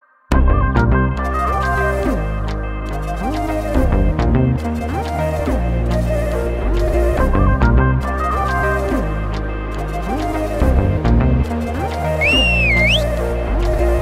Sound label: Instrumental